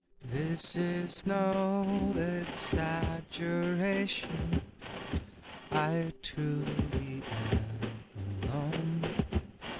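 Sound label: with drums inst src; SiSEC08; SiSEC underdetermined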